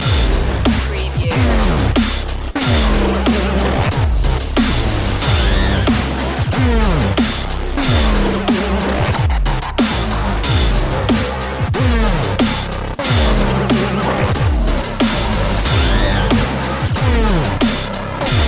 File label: dubstep of frying cpu loop